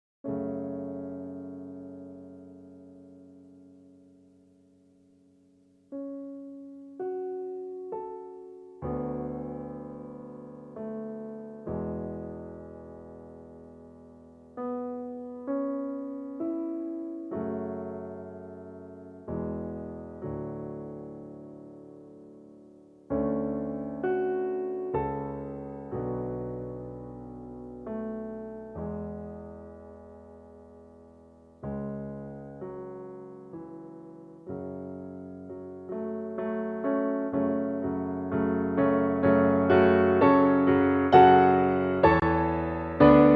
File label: Piano solo; Gladiator